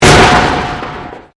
Convolution Pulse; Sound elements to create more complex sound effects; Swell Sound Effects; Swells (Sound Effects:Sound Design)

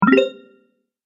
Health Boost, video game

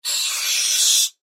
Sci Fi Air Burst Expel Pneumatic Hose Discharge; Sci Fi Air Burst Expel Pneumatic Hose Discharge Processed Science Fiction; Doors

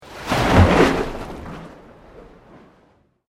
Sweep Organic Slow

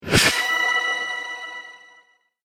Wizard Stun Flash, Magic Sound Effects

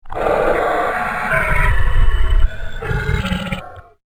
Sleeping Dragon, Monster Sound Effects; fantasy sound effect; role playing games, magic videogames

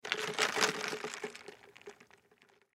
Cup Pick Up Move, Cup Pick Up Ice; Pick Up Sound Effects; Foley; Cup Pick Up Movement Ice Drink Fountain Soda Pop Fast Food; Blastwave

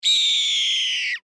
Animal Hawk Screech Red Tail; Birds (Sound Effects:Animals)

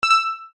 UI Rollover Minor, User interface feedback sounds

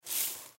Sand Movement; Playgrounds; Foley; Blastwave

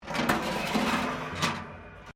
METAL BIN SCRAPE WIRE FENCE; Metal (Sound Effects:Materials)

